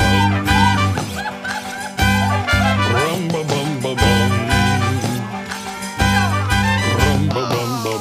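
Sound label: food world music inferno suspense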